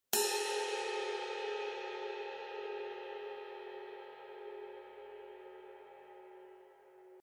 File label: Bow, Sabian 20" HHX Evolution Ride Cymbal